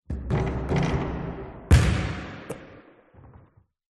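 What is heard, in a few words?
Basketball Miss Shot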